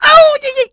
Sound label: hit voice ouille; perte